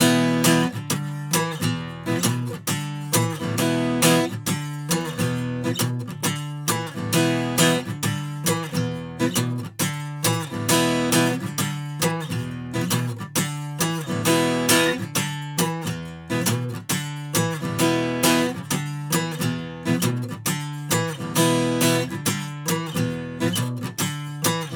EQ Acoustic

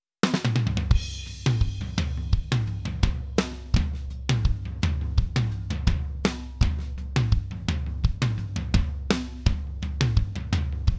Tom Groove Fet II Compex; Daking

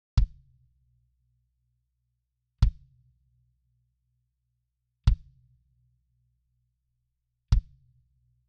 Kick EQ; Daking

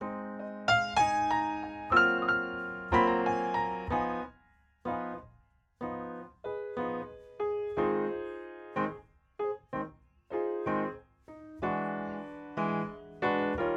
TubeTech EQ Piano

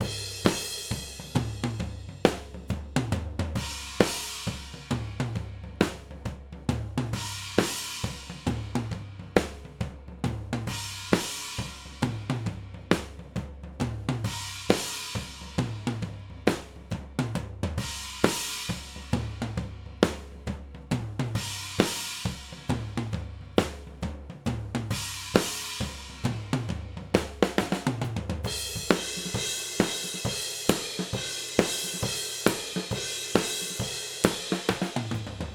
High Res Nail OHs

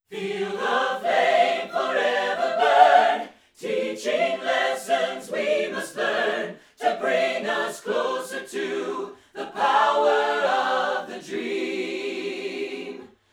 Fet III Choir Flat; Daking

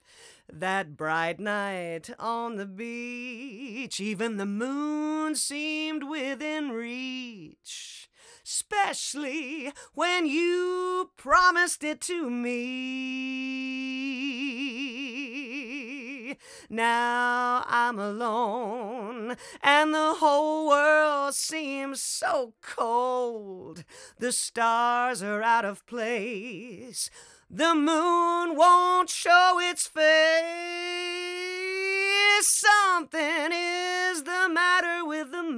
EQ Lead Vox; TubeTech

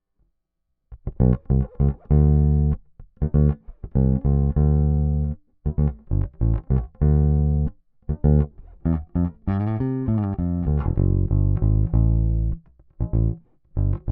Electric Bass EQ; Daking

